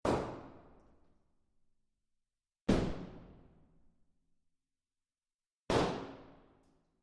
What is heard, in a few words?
Indoor Shots